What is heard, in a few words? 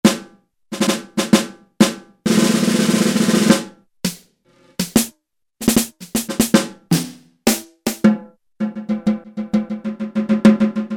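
Orchestral Snare; Roland L-CDX-04 Strings And Orchestral Percussion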